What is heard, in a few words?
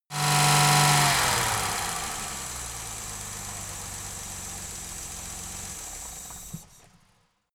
car jaguar e-type turn off engine